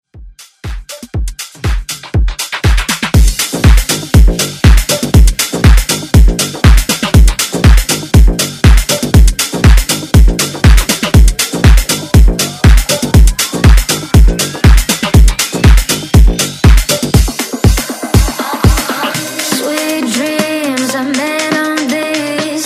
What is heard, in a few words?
Dance